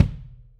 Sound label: Acou Kick, drum